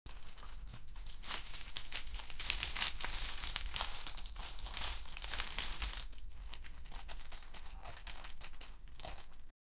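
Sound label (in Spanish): sonido del río más fuerte; bridge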